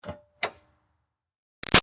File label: Car Highlander Gas Door Open; 2005 Toyota Highlander V6; Cars; Vehicles